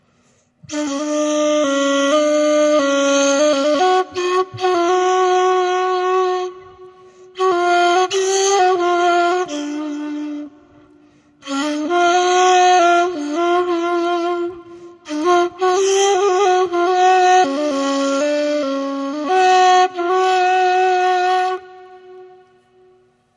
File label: chahargah